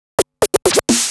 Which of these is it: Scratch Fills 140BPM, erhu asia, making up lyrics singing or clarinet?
Scratch Fills 140BPM